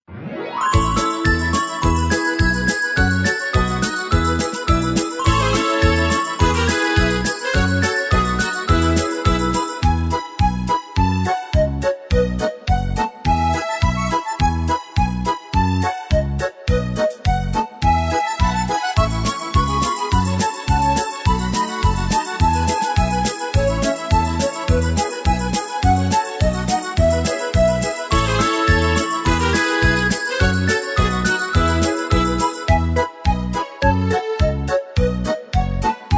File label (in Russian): Novogodnyaya polka minus